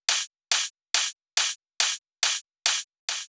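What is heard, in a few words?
drum Loop 140BPM overhead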